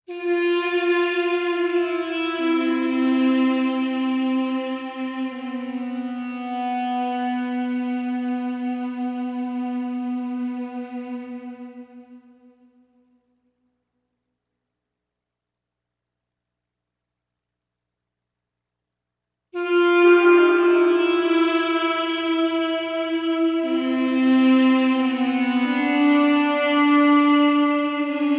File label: Wards (Ethnic Solo); Mass Effect